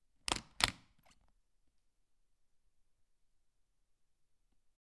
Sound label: Briefcase; Trevor Webb